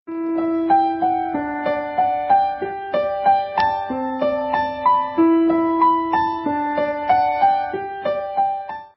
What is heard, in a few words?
peaceful piano; classical